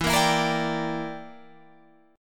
E Minor 7th Flat 5th, Guitar, Half Step Down

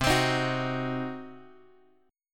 C Diminished C; Cdim Chord {X 3 X 5 4 2} strummed

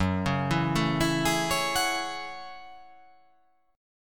F sharp Minor 9th F sharp

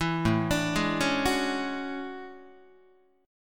A Major 13th Guitar; AM13; arpeggiated; JGuitar

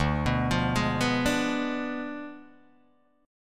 D Diminished 7th D down Guitar DADGAD

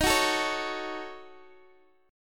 Eb Minor 7th Flat 5th, down, Guitar, DADGAD

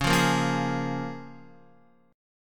Db 6th Db, down, Guitar; Db6 strummed; JGuitar

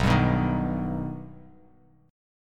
B Augmented B, chord; strummed; JGuitar

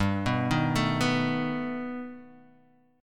G Augmented Add 9th, chord; G+add9 Chord {3 2 1 2 0 X} arpeggiated

